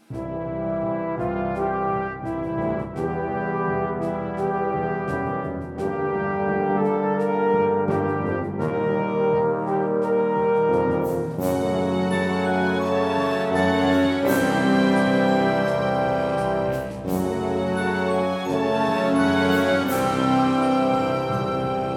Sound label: music; singe